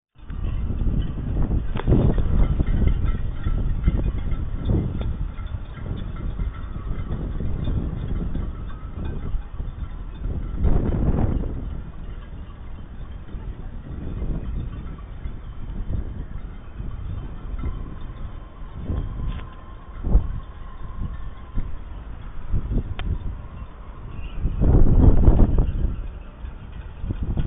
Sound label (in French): Port de plaisance